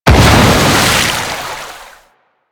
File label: Water Tomb Explosion; Warframe; Yareli